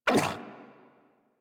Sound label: Puppy Idle Fall; Enemies; Vocals; Kubrow; Warframe